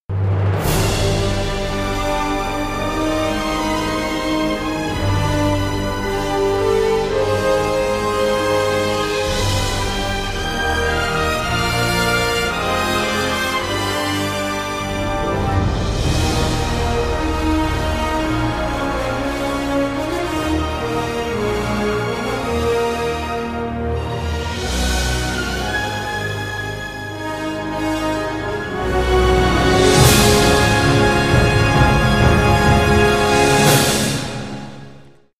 片头音乐

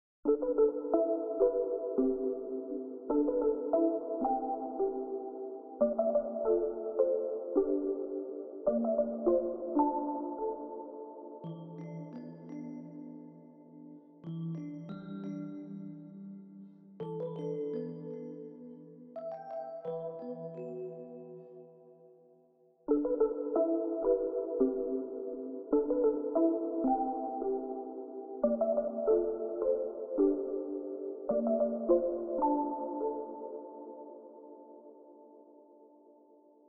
背景音乐

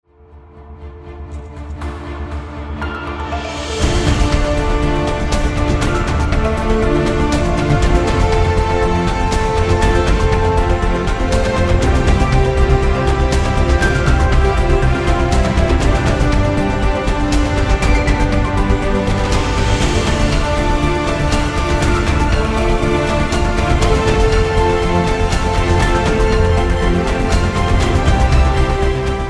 专题片背景音乐; 30秒以内

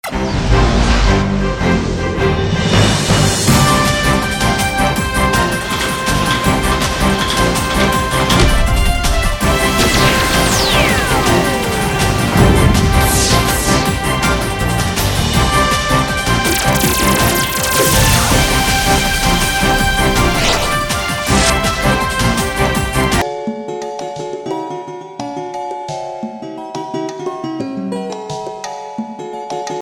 电视包装音乐

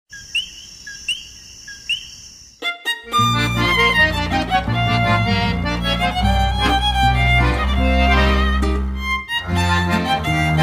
讲故事的背景音乐; 3分以内

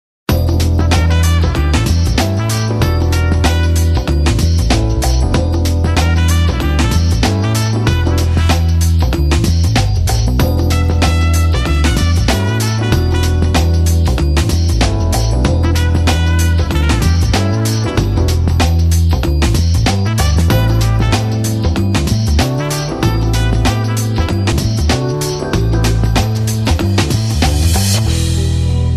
欢快的背景音乐

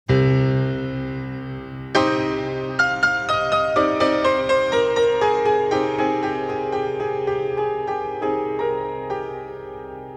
广告片音乐; 广告片背景音乐; 3分以内

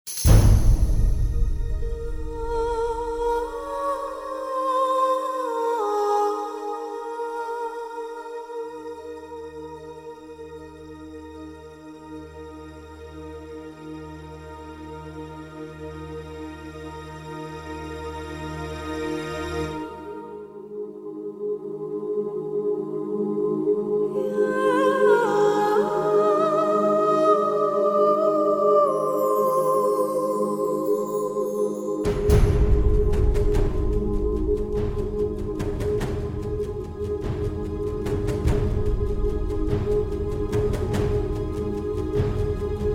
企业宣传片背景音乐; 3分以内

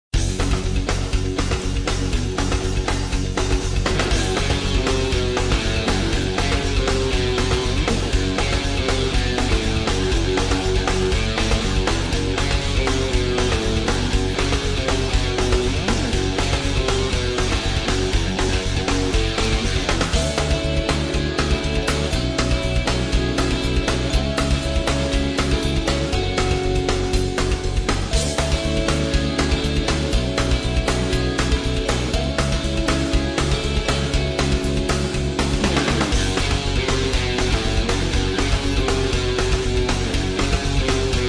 片头音乐

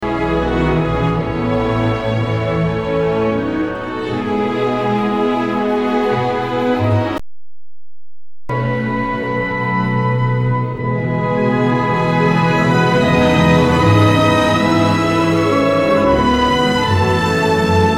电影音乐; 奥斯卡电影音乐

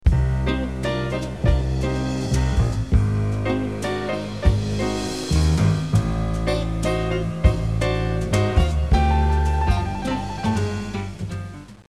片头音乐

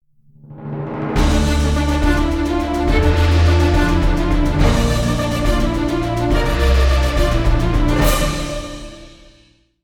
大气的背景音乐

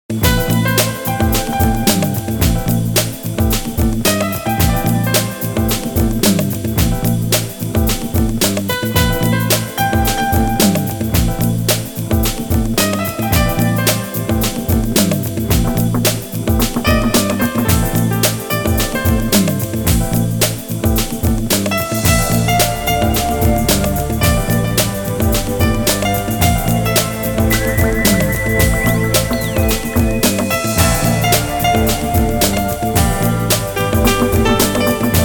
纪录片背景音乐

